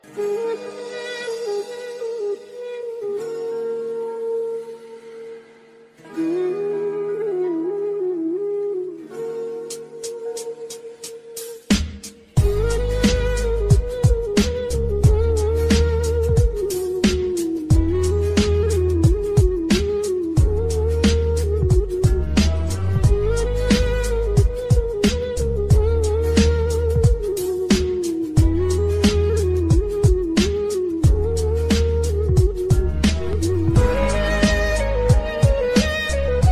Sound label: Sad Music Ringtone, Sad Bgm Ringtone